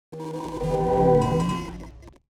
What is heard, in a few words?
Movements; 99Sounds; The Weird Side Samples